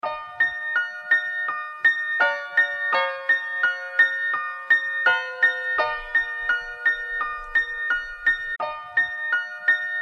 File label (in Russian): dozhdik pianino